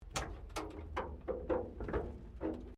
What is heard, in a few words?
WRECK DOOR PANEL CREAK OPEN, Wrecked Cars; Wrecked Cars, impacts, crashes, scrapes; Crashes (Sound Effects:Objects)